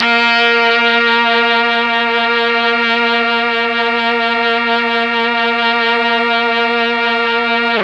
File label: A#2 Brass, Mellotron MKII; Mike Pinder - Mellotron Archive CD